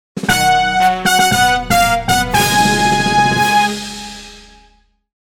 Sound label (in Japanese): ファンファーレ